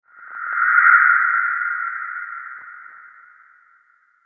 high noise sweller

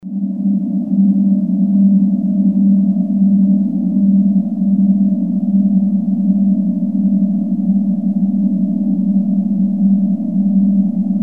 spaceship engine

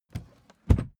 suitcase close case quick

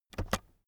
keyboard external space press fast soft

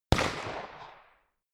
rifle single shots

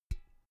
empty can damped hit soft

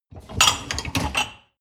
dishwasher upper tray draw out short

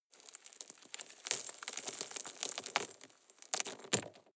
drop rock debris very soft

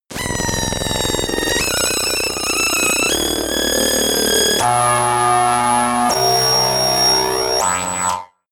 screaming synth sequence long

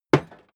hammer wood hard

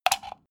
socket plug

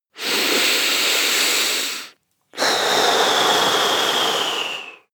breath male deep blow

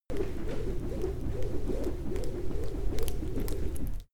lasso swing

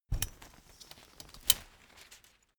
umbrella big close full

